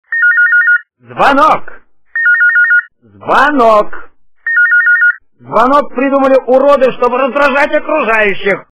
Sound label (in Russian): zvonok zvonok zvonok zvonok; прикольные звонки